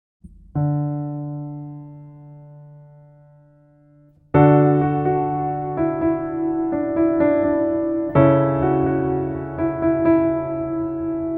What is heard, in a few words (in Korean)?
Piano ver 멜로디MR -1키